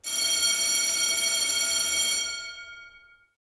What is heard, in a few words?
school bell; butterflysoup2